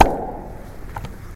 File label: Loud Popping Sound